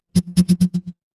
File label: office phone vibrate